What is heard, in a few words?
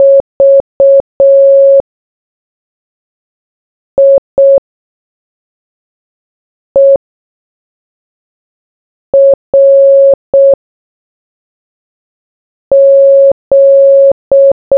morse